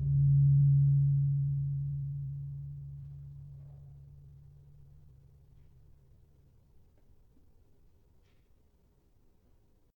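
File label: Gong D3, gravity harps tuned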